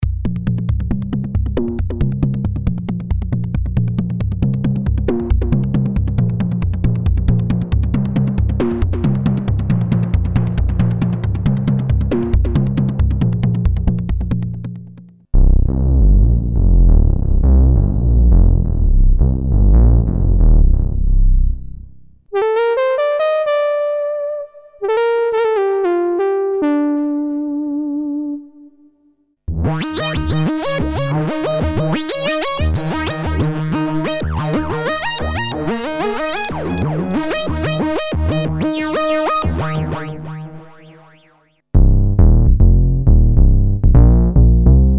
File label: soundset; pulse rp 2000